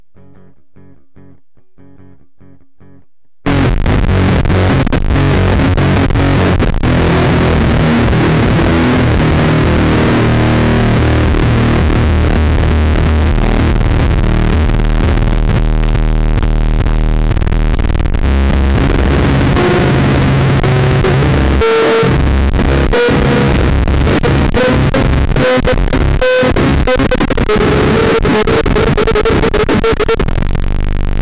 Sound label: Convulsion Guitar; audibleDisease